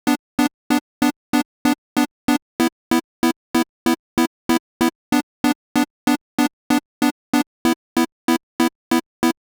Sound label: Synth